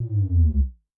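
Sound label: jungle bass; Yamaha DTXpress-II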